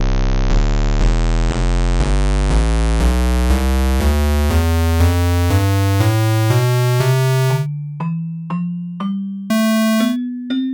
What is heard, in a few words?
crystal